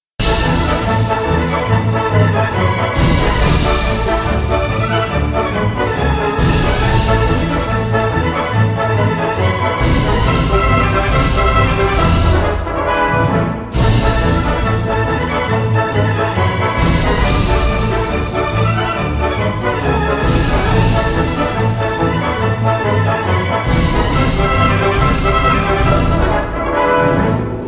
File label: flamenco